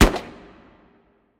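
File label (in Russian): короткий глухой выстрел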